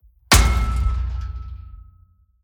Impacts processed; Just Impacts; soundbits

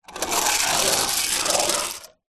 leisure toy skateboard tiny roll floor

